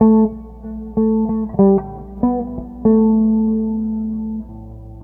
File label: Jazz Guitar; Sonic Foundry (Sony Creative Software); Stylus Pressure Urban Grooves on Digital Wax